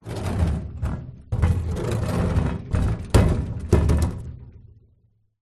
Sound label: industrial heavy object load into metal trailer